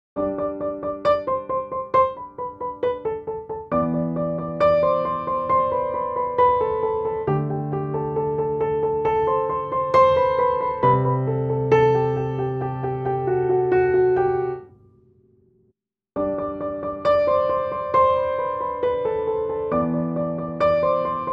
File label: PIANO Upright Room